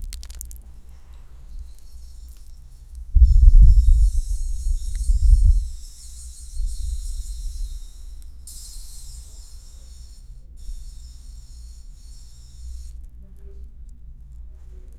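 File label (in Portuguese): sons de caçada passos com, hunting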